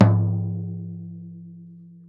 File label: floor